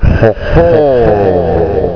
Male Monster attack; Mac Rogue 3.0

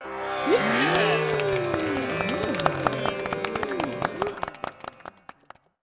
cheer; petitioners

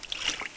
splashing; Exile

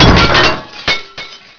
bang

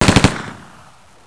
Machine Gun; Close Combat

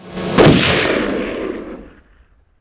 gunshot; PC Gamer IT CD 45; SMAN DEMO; baddys; brutal

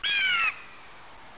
gull; Wayzata Technology; ThePearl

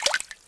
WET; PC Gamer IT CD 49; UrbanChaos

